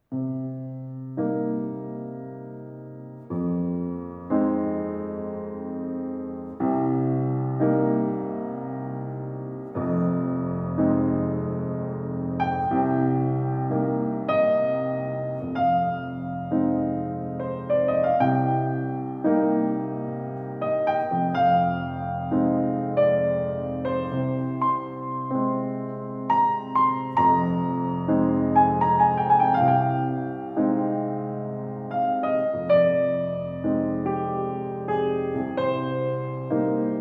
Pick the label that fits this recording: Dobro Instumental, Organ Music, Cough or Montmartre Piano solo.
Montmartre Piano solo